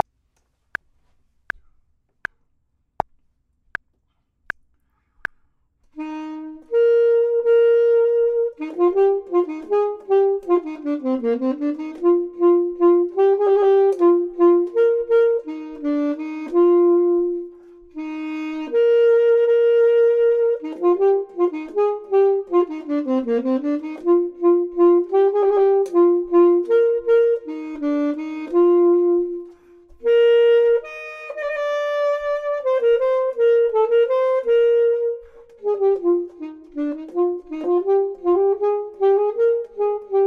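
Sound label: top, Saxophone and Clarinet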